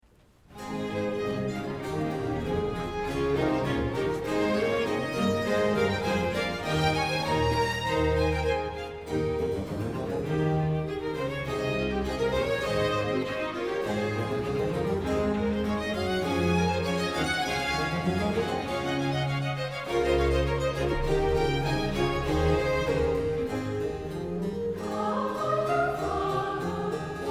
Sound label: Chorus; Come mighty father